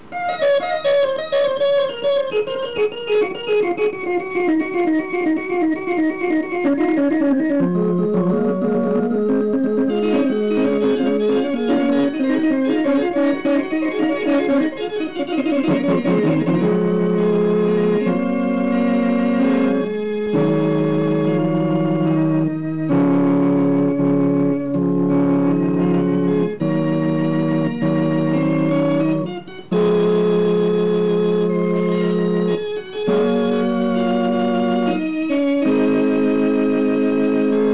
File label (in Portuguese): orgao